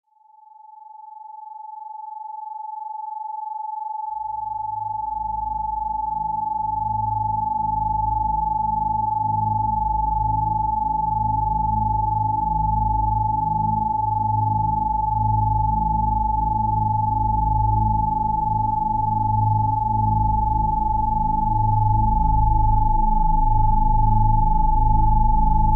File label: BETA 852 hz Brain Hemisphere Synchronization, binaural beats; See Through Illusions